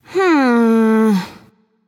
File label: Thinking